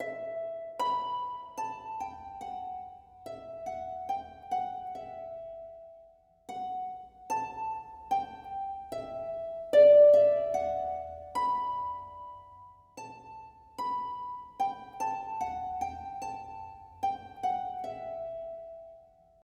melody a b c needolin loop

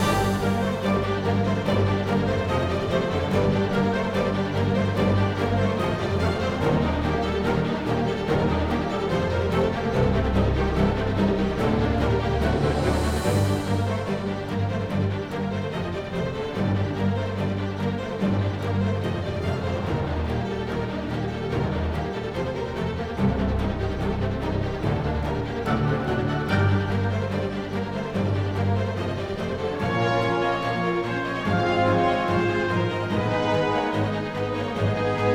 Flower Live Vox ORCH STEM w intro vox; Hollow Knight: Silksong

